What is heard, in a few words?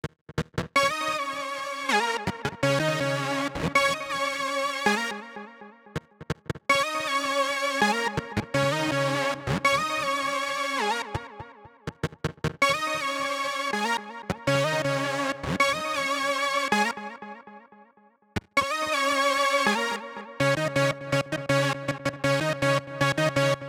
synth, Smooth RnB, Ebmin; Big Fish Audio; Legendary; Happiness